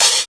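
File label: OHH, open hi-hat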